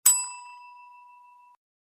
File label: service bell; discord